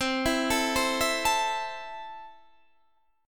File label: A Minor C, guitar